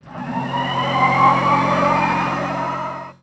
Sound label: Power On Light